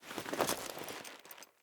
Foley Move Short; Friendly; Deora

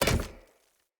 Short Sword Medium Impact Wood Hollow Large

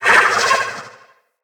Corrupted Slug Vocal Pain

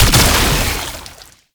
Techrot Conjunction Explosion; Gameplay; Warframe; Nineteeen Ninety Nine; Deep Archimedia

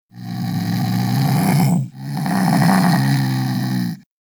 Vocal Snore; Warframe; Hunting Critters; Vulpaphyla

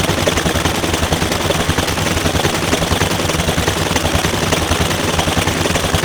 Vay Hek Drone Loop; Warframe

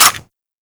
Mech, Lever Action Pistol; Warframe; Grineer

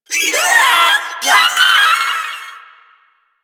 Proto Infested Volatile Runner Taunt Scream; Enemies; Abilities; Warframe

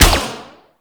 Minigun Shot; Warframe; Corpus

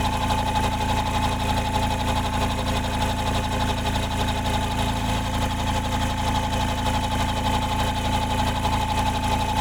Injection Chamber Tube Mover Loop; Ambience; Warframe; Grineer Ocean; Ocean Sabotage Injection

